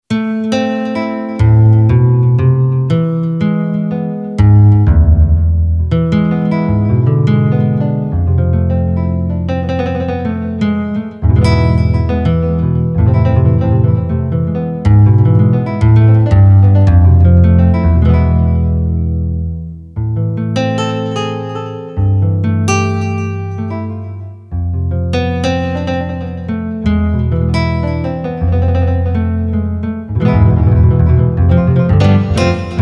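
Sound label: Spanish Guitar, Yamaha PSR-550; Voice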